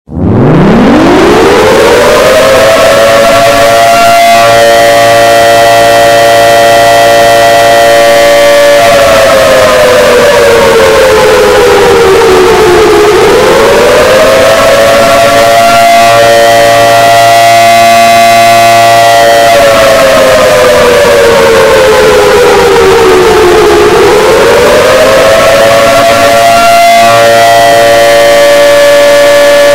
air raid siren

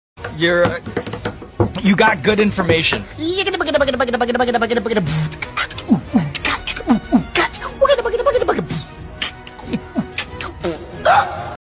Beatboxing meme

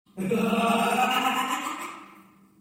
Goofy Ah Laugh

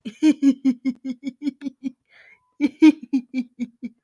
Goofy ahh laugh

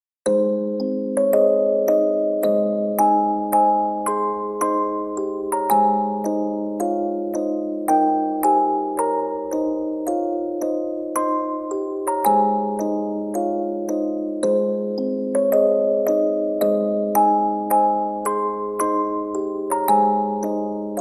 Music Box Sound Effect; Erika

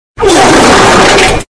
wet fart earrape

